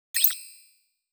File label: quick positive video game notification interface